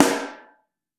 SN Mono, Snare

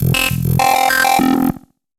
Holding Pattern Seq, Synth Rhythmic; Ableton; Factory Packs; Punch and Tilt

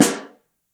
SN3 Mono, Snare

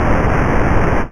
White noise; Ableton Foundation FreePacks; Foundation Digital Synths

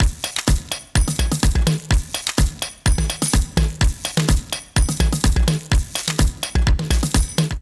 Toy Club Kit 126 Bpm, drums; MIDI Clips; Ableton; Factory Packs; Vibrations; ModeAudio

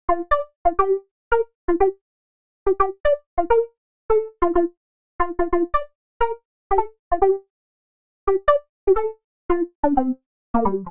Pluck G Minor 88 bpm; MIDI Clips; Tonal; Melodic; Please Pizza Pluck; Ableton; Factory Packs; Chop and Swing

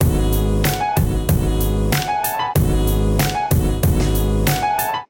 Kit Melodic 94 bpm, Drums; MIDI Clips; Canvas; Ableton; Chop and Swing

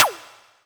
pitchmod; kidGlitch; Ableton Foundation FreePacks; Wicked Kits

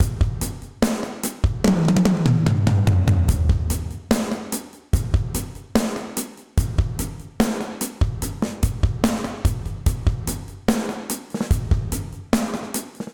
Fill DarkPlateVerb 73 bpm, Session Drums; Fill2; Bricks; Ableton; Factory Packs; Session Drums Club

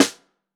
SN Bottom, Snare

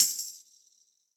Tambourine BigPunchy; Drum Hits; Standard Hits; Ableton; Session Drums Club

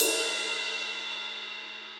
Ride Edge WarmAndLow; Drums; Drum Hits; Standard Hits; Ableton; Factory Packs; Session Drums Club

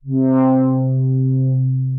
Broken Wing Pad, Synth Pad; Ableton; Creative Extensions; Poli

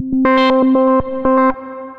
Stepper, Synth Rhythmic

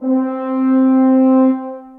French Horn Section Legato; Ableton; Orchestral Brass

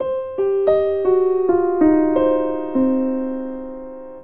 Phrase Lazy Blues C Dominant7 113bpm, Grand Piano; MIDI Clips; Ableton; Factory Packs

